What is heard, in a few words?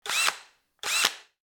electric drill